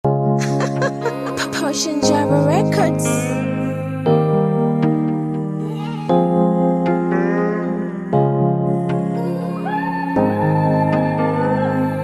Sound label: Acoustic